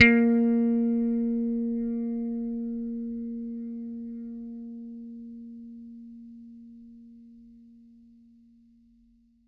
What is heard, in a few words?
PLUCK A#3, Bass; Good 80 Pluck; Bass Mace; DirectWave